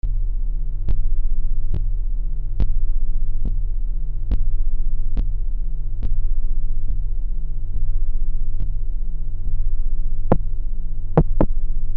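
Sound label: working MRI scanner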